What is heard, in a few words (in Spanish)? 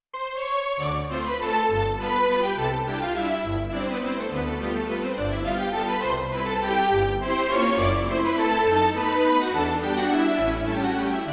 Yamaha p 45 Orquesta de cuerdas